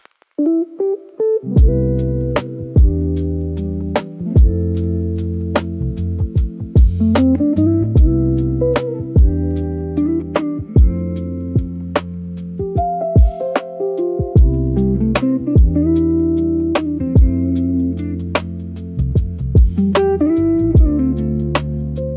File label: Rainy Night Study Beats